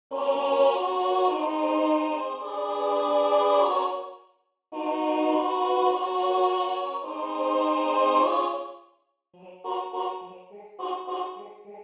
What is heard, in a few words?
Tenor